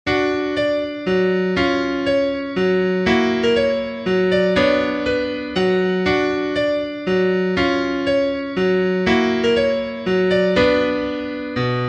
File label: Mazurka; Artobolevskaya; Grechaninov